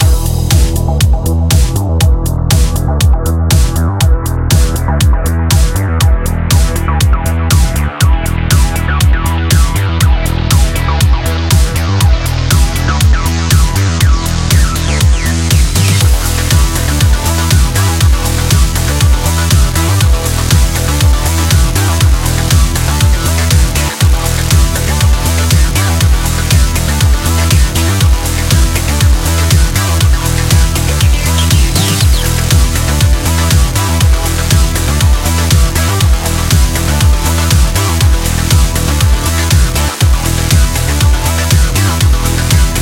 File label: Music Game; Rally Champ